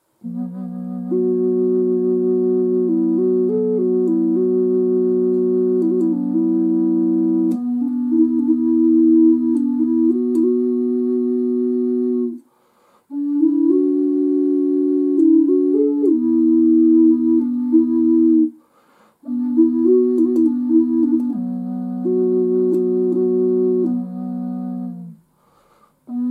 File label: sound test, flute; huaca